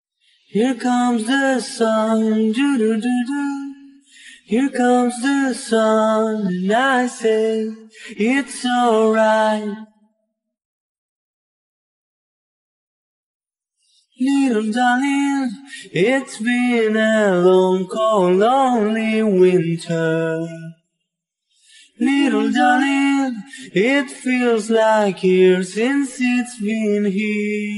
vocals

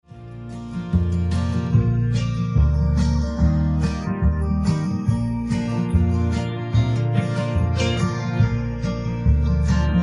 accomp

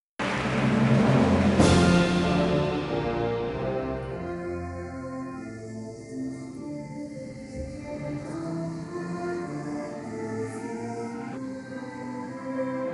accomp

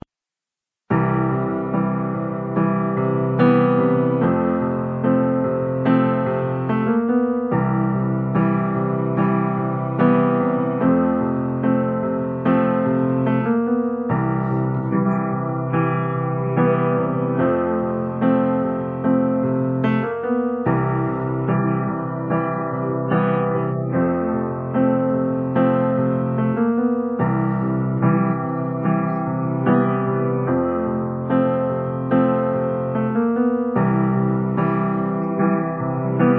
accompaniment

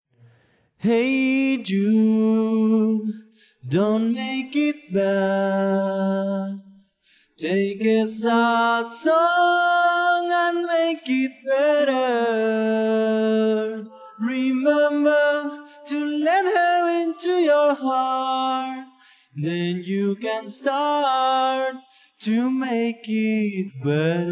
vocal

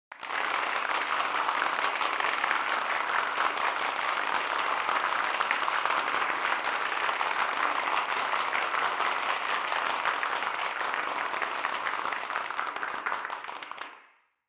applause; Reverb; NormalizeIR

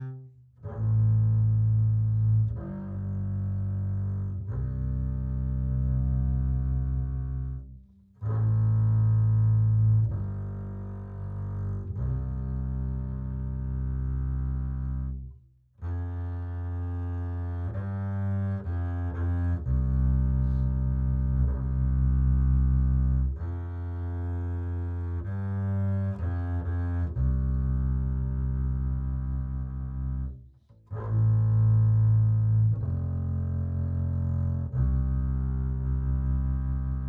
Bass

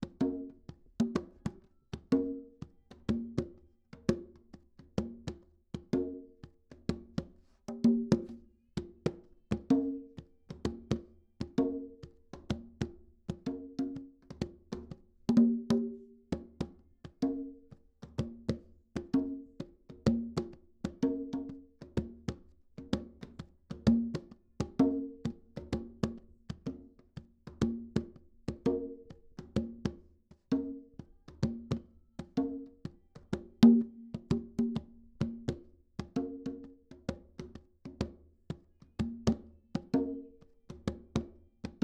Congas

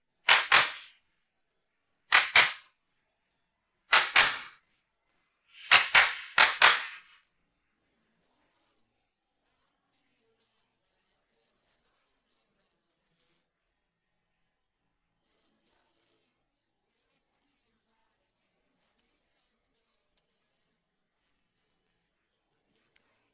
drums

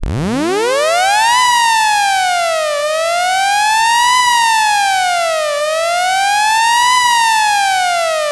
siren

